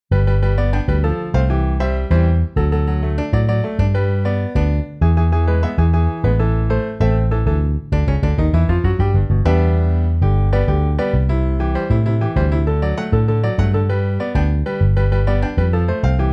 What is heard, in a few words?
Piano Bass